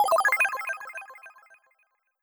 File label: accent synth bell e major lfo